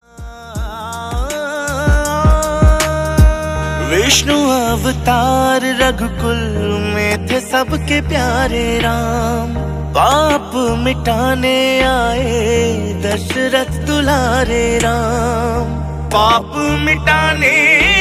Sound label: Ram Bhajan